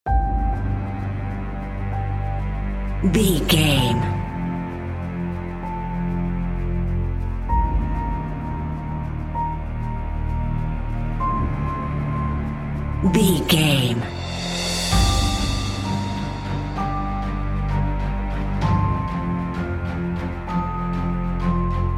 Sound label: epic cinematic